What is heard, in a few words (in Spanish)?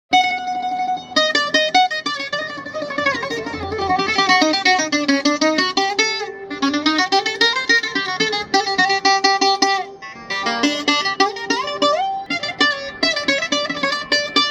Instrumentos musicales. buzuki